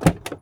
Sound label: car door open; Almost Maine